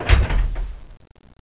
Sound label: foot thud clang, Robots; Gunlok